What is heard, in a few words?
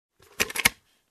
Sewing Machine Drawer click